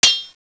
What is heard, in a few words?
Sword